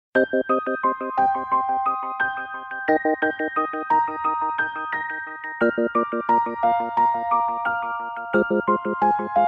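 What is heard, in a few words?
Pleasing Soft Ringtone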